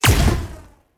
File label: Weapon Shoot